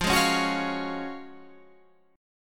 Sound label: F sharp Major 7th Suspended 2nd F